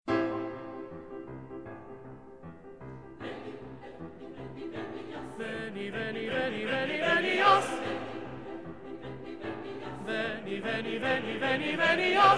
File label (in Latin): Veni veni venias Coro II Tenor up to tempo